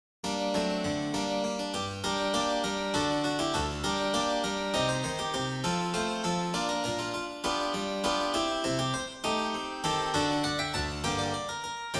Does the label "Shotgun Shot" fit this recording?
No